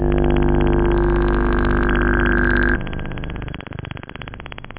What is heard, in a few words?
ORGAN; da capo vol1